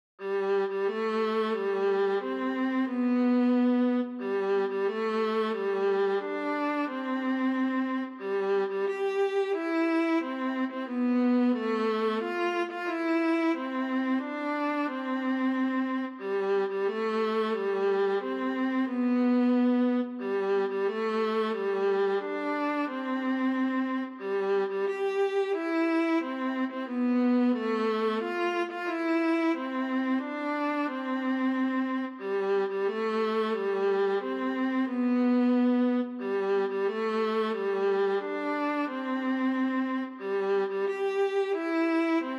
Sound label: solo Happy Birthday